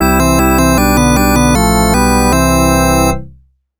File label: game over; arcade; bomb jack